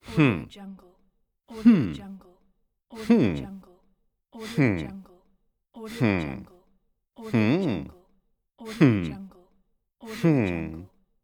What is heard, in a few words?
male hmm